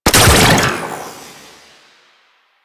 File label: Freeze Ray Shot; Warframe; Weapons; Corpus